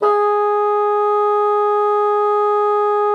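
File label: bassoon mezzo G#3; Xsample VOL-6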